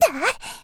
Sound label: attack; Eternal Return; Tia; Voice Line; KOR